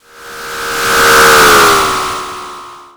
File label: Retro Vehicle Car